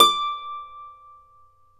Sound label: Celtic Harp, Plucked Strings; E-MU Producer Series Vol. 7 – Old World Instruments